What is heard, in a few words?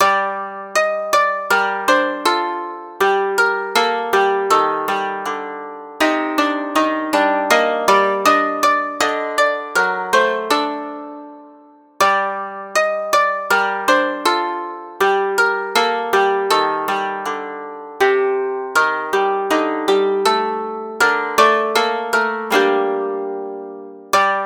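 early spring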